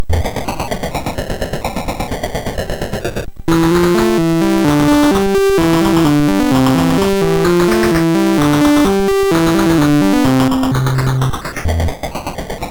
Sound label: Jodler sid MOS6581R3